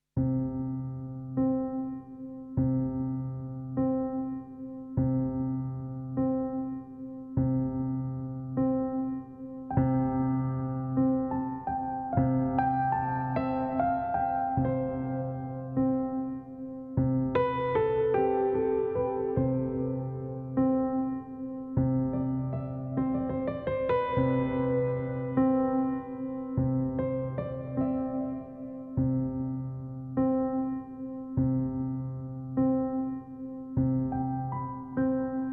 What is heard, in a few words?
Gentle Breeze Voice Over Mix